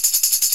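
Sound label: tambourine shake; FAIRLIGHT CMI IIX; PERCUSN1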